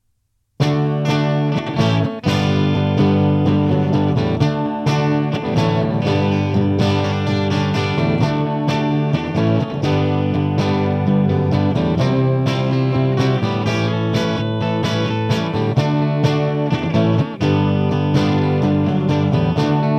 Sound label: MAXON OOD9